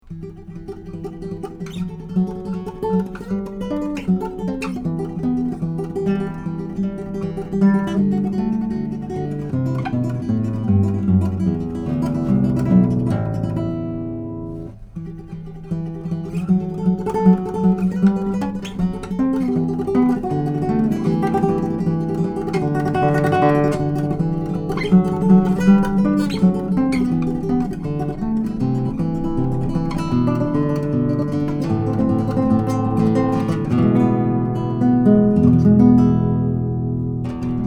Tremolo, Michael McBroom 10-String Classical Harp Guitar; Improv